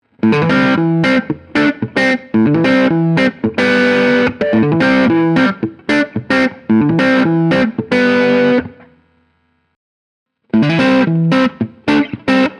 Breakup Lick, Neck Bridge Series, Neck, Neck Bridge Parallel, Bridge, vintage guitars